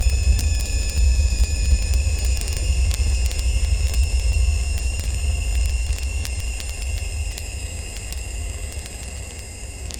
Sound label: magical materialization, metal creaks